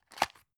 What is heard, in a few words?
drug open; arzt